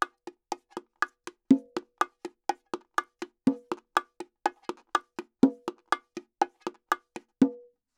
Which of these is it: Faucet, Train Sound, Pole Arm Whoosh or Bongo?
Bongo